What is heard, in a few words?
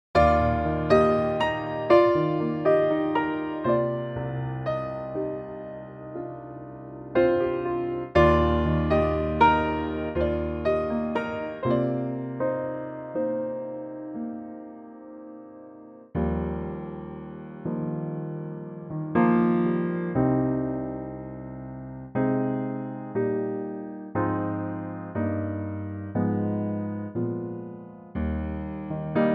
Eb piano